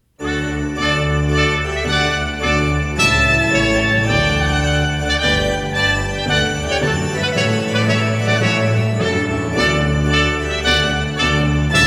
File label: 2 Trumpets Organ and Timpani; Wedding Ceremony Music; TeDeum